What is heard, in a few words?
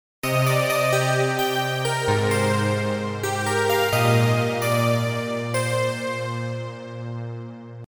tone